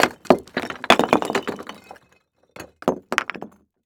Crash Brick Tumble Down; crashes; AdobeCC

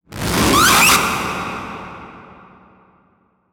Title Transition Laser; Production Element

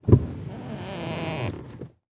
Truck Ford Ranger Adjust Seat Tilt; transportation

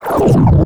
Multimedia Internet CD-Rom Flash Wipe

